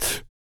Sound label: TEETH CLEAN, Vocal; Spectrasonics; Vocal Planet; Blues Soul